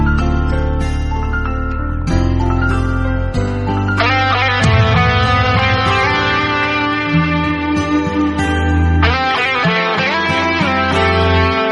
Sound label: Epic BGM, Sad